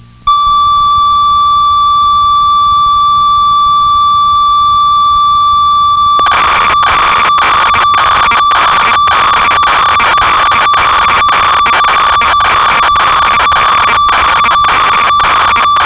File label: ob6, synth; Oberheim